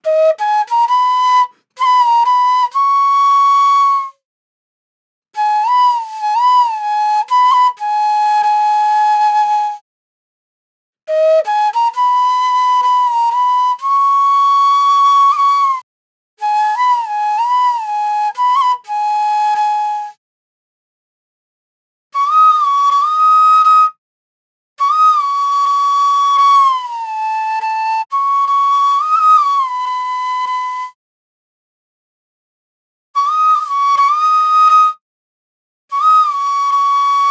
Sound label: 90s Flute Loop B